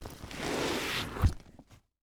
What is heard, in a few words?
Sports Bag Duffle Bag Lift Ground